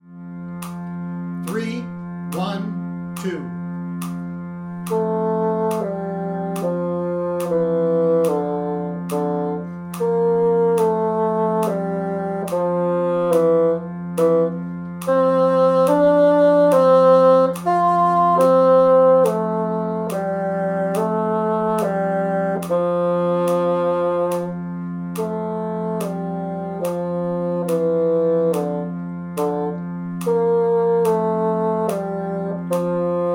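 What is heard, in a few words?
Duet Bassoon 1 72; Satzenhofer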